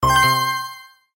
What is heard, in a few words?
weapon bonus